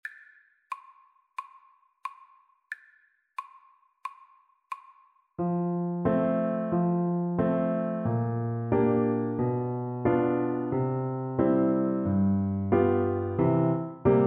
Bassoon

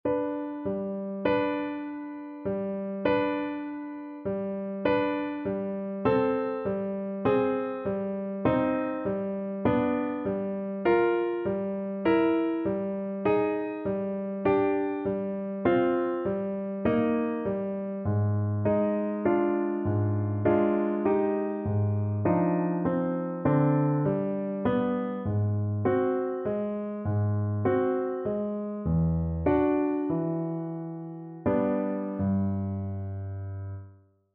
romanian folkdance, Trumpet; bartok

